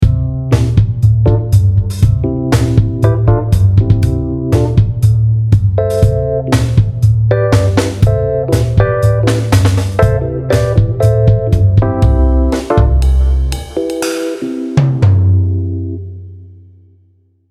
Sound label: Piano Trio